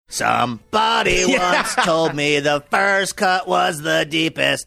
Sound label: singing somebody once told me